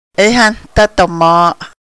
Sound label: laugh so hard lean backwards